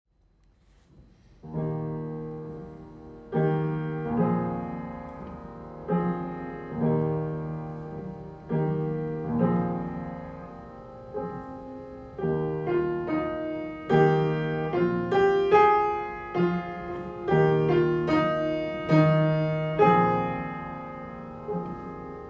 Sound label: solo piano; Britten